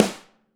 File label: AMBSN, ST AMB SNARE